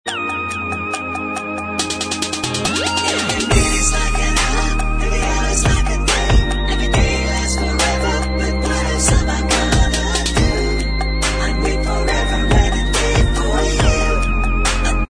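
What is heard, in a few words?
backing vocals, backing tracks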